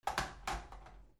PHONES

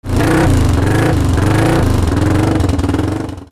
VEHICLES

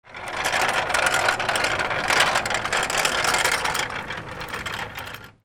TOYS

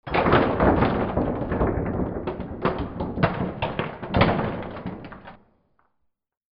FALLING

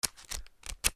PAPER

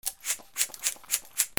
GARAGE

